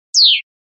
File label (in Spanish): remate chio